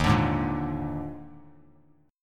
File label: Eb Major 7th Suspended 4th Eb, Bass Standard; strummed; EbM7sus4